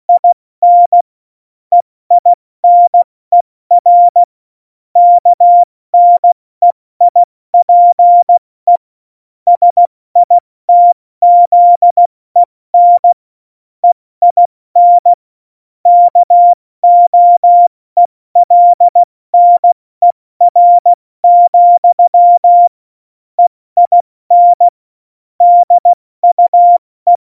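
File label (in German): Witz, cw morsen; Morsekurs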